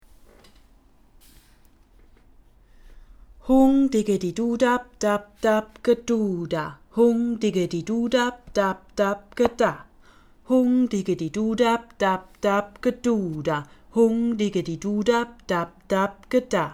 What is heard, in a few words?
rhythmus zip dap